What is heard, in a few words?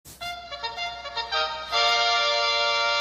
announcement trumpet